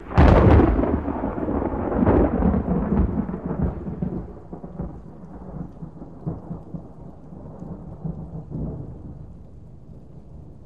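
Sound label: Thunder Crack Distant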